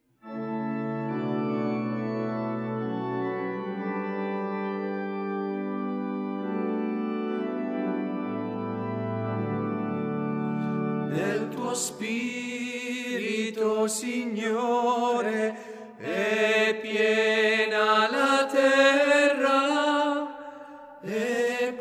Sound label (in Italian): tenori